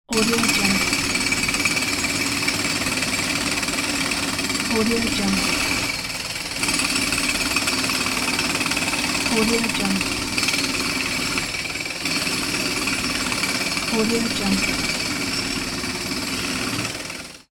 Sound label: construction site jackhammer loop